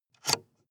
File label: sports car int ventilation adjust; verkehr transport; autos